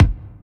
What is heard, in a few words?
Kick, Kickdrum; Equipped Music; Loungin House